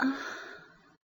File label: Moan